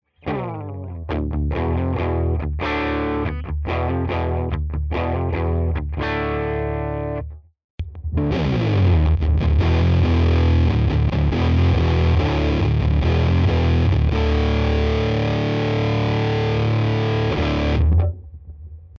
FUZZ OFF ON

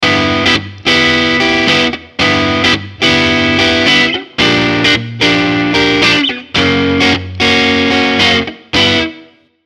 Phantom Stratus

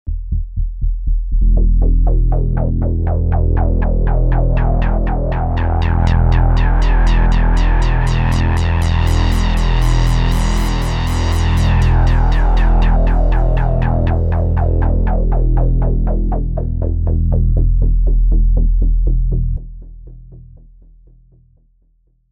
arpeggio

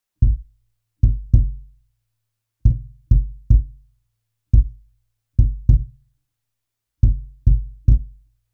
Cajon classic

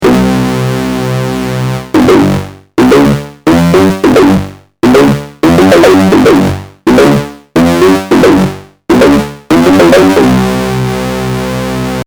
microbrute; Beijing Broiler

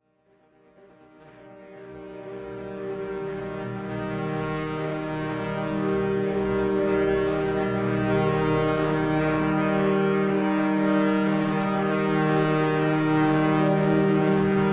Novum Emitter Slow; Dawesome

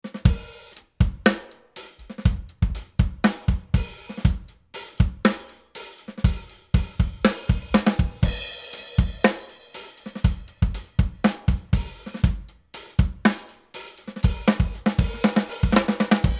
Rupert Neve Designs 5254 Drums

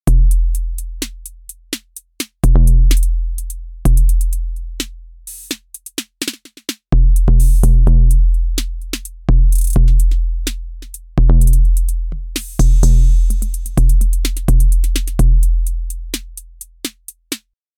TR-606

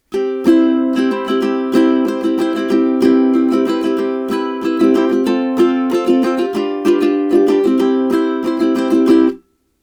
Ukulele COMP3A Jr

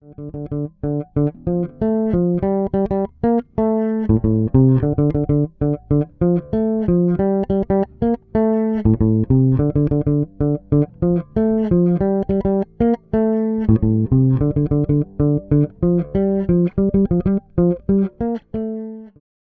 Fodera Emporer Standard Bridge Pickup

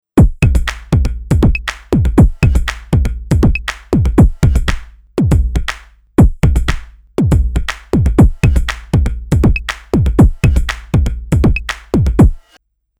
TOMS

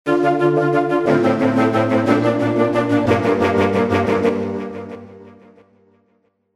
Winded Ostinato

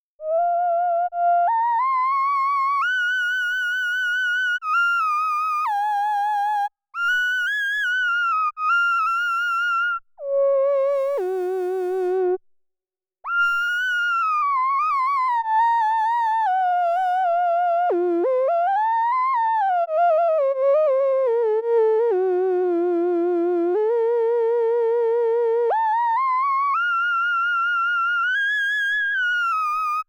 Whistle Leadsound

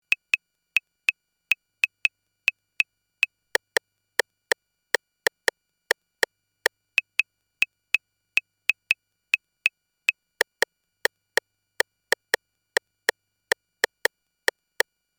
Clave Rimshot

